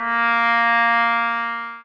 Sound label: sax